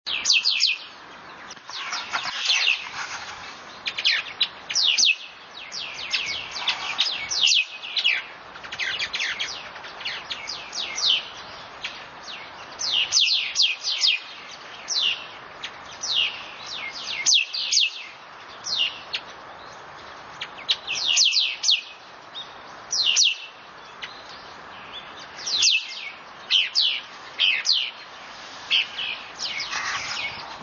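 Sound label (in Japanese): tori no koe shumei fumei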